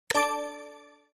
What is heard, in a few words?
Click icon